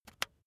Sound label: razor attachment retract soft